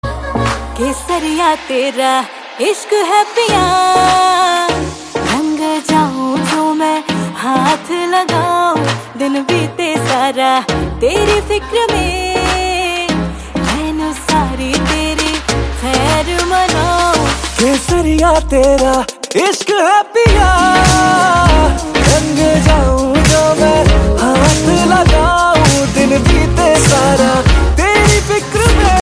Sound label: dj remix song tone ringtone, bollywood